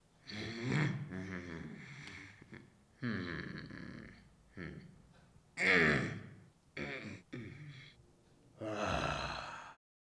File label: Male Groaning Mumbling